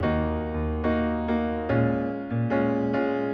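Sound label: piano, Fathead Blumlein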